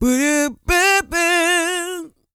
SING, Vocal Planet R&B & Dance; ILIO

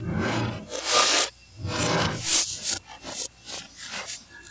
FX Loop, Drums & Drones

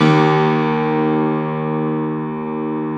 Classical Piano; Best Service ProSamples vol.53 - Classical Prepared Pianos; Total Piano; Brilliand Classical Piano; Classical Piano mp +ped

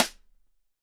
Sidestick; EMU PX-7

